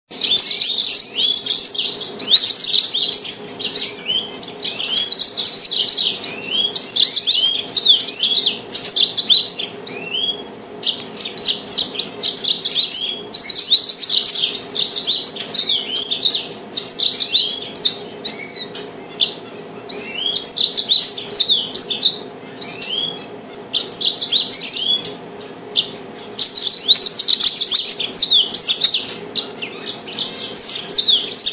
suara pancingan mozambik koloni

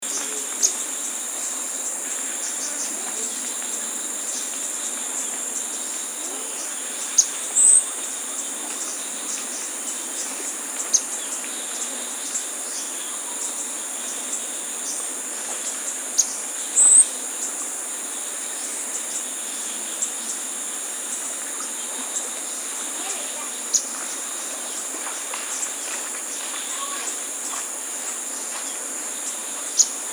suara burung cabai tunggir coklat

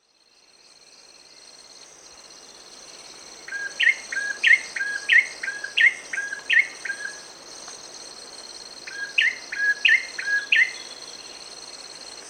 cinenen merah; david edwards

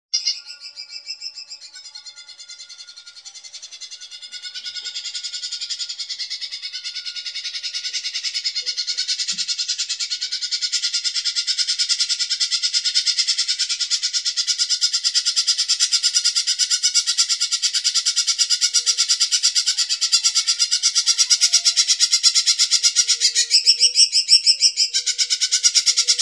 cucak ijo tembakan tengkek

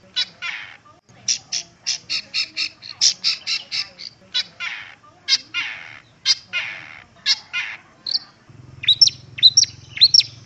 suara cendet isian tokek

